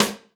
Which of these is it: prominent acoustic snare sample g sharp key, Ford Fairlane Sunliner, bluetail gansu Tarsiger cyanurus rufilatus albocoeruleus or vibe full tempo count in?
prominent acoustic snare sample g sharp key